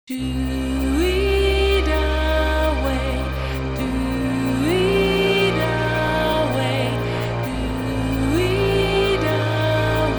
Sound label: Vocal Orchestration